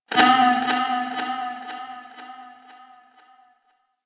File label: Ship Energy Bounce; Ambience; Warframe; Corpus Ship Remaster